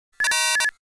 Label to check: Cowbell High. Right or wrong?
Wrong